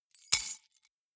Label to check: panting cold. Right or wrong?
Wrong